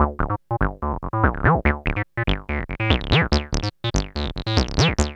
BASS, TECNO; AMG - Vince Clarke Lucky Bastard

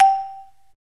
MARIMBA VIBES

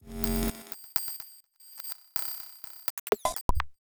Rhythmic; Sonic Foundry (Sony Creative Software); Underground UK House & Electro

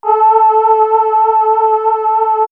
Swirls Voice, pads; Obie Voice; X-Static Goldmine

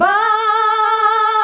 BAH UNI A, FEMALES; Best Service; Hyper Dance

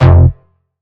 Bass F, Bass Synth

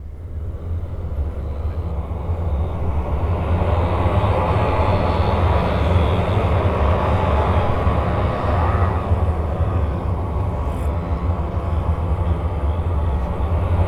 GUTTERAL, Voices

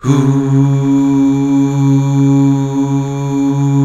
UUH

